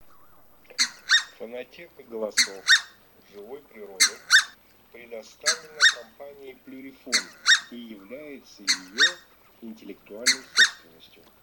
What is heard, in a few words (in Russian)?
Казарка краснозобая Oca Collo Rosso; plurifon